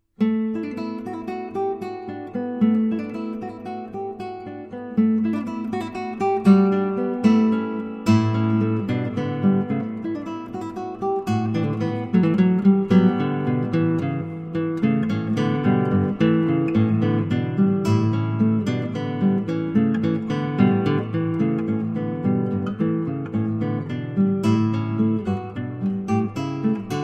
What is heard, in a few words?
reveille